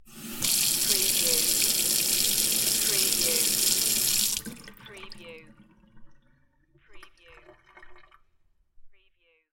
Kitchen sink, turn on water, turn off, water flows down drain